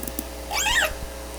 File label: call, Orca; SRKW; Alwin